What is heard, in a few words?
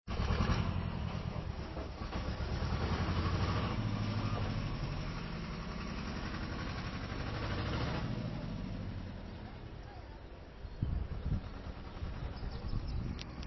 tractor